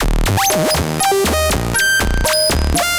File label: Sqeak, Synth; Ableton; Factory Packs; The Forge by Hecq